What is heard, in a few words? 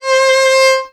VIOL C4, VIOLON, Arabian Traditions; USB Soundscan vol.43; AKAI